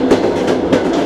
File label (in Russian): stuk, train rail; Factorio Paranoidal mod; newtrainsfx